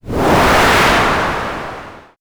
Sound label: whoosh